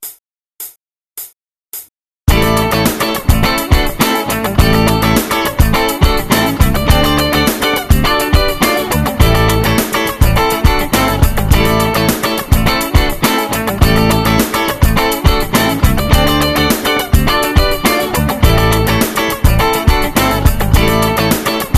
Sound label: funk